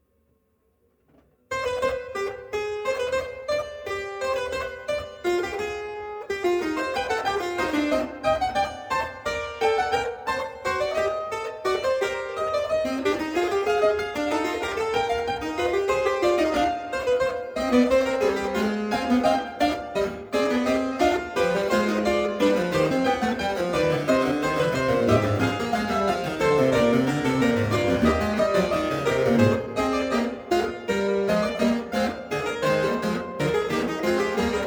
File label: fuge c min, Flemish harpsichord; historical instruments